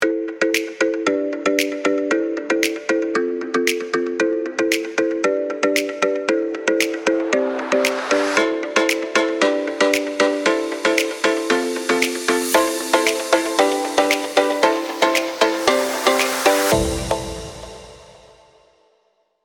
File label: music tropical pop